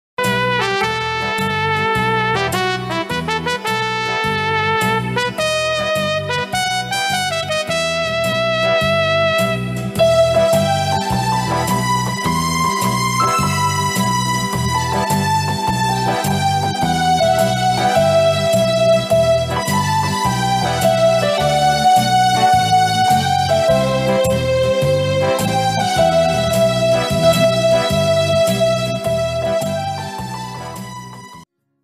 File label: independence day ringtone desh bhakti ringtone; 15 august